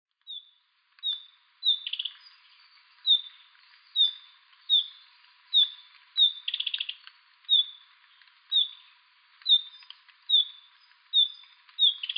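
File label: Narcissus Flycatcher; kibitaki; sado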